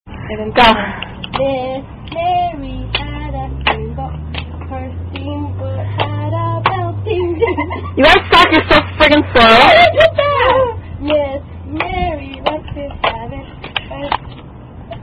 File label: Little Kid Games [Miss Lucy Had A Steamboat]